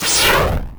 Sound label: missile launch, weapons